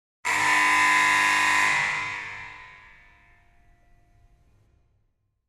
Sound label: basketball buzzer